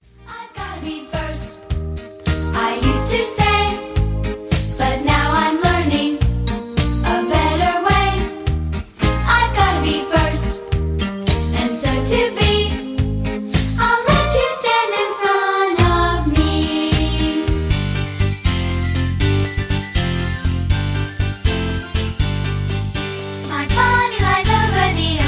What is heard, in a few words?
CHILD